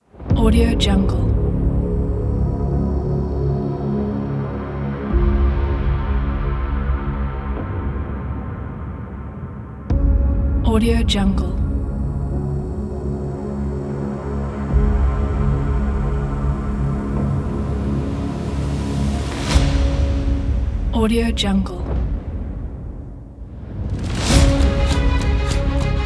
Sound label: trailer cinematic; zmsounds